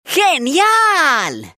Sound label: jazz; rocktopus